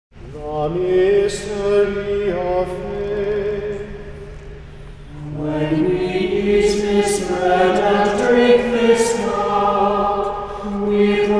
Memorial Acclamation B Chant, New English Translation of the Roman Missal; ICEL; Lenten